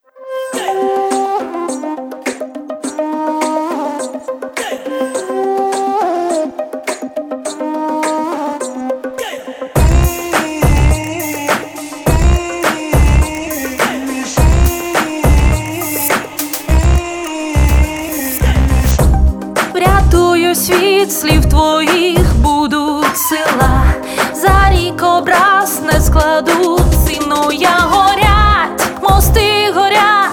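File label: pop backing track, cried, Ukrainian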